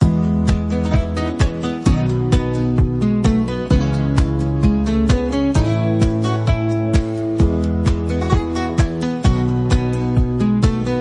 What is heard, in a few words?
positive nature acoustic loop ringtone